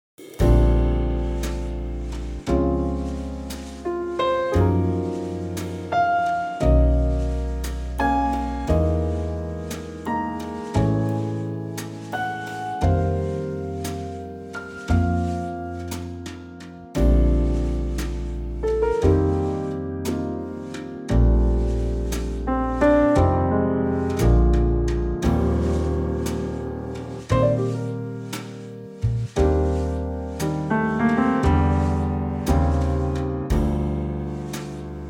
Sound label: Bb to B trio